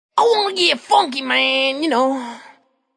Vocal I Wanna Get Funky Man 110, Funk vocal loop sample; 110bpm